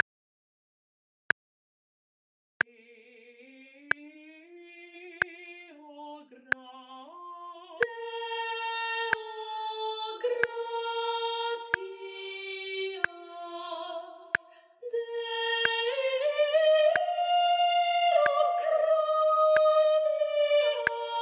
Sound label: Soprano Click 46 Minim